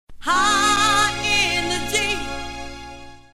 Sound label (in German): Jingels